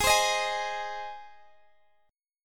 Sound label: Ab Major Ab, Guitar DADGAD; x,x,18,17,18,18; down